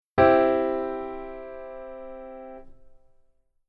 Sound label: keyboard voicing